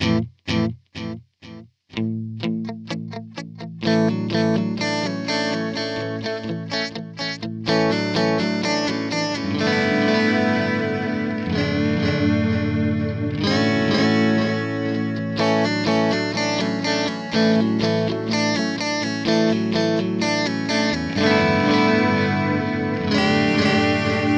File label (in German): Silos Delay Digital Mix 2 Uhr Repeats 11 Uhr; EQD